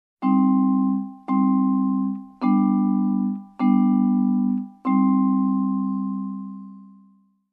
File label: Vibraphone sad minor chord progression